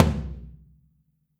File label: FLTM OH R, Kit OH Mic, Drums Percussion